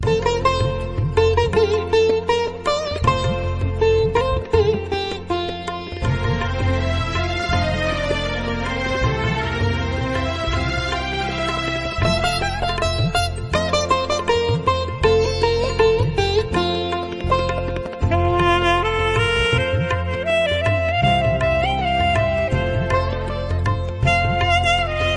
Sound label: Rabindra Sangeet Instrumental Ringtone; ringtones